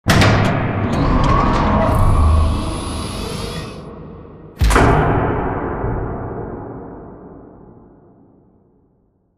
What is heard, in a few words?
Giant Floor Panel Assemble Rotate Mech; Boomtown; Sideways Town; Titanfall 2